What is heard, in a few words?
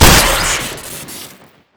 gyrojet shoot crit; tf2classic